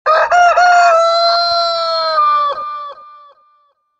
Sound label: Rooster Notify SMS